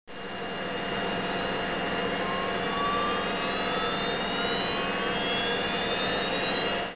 Spin Up

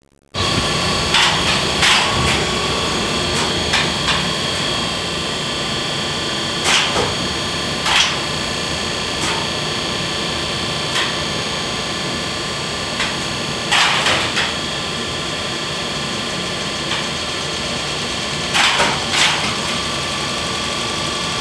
ELEVATOR; ambient; energy

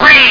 QUACK

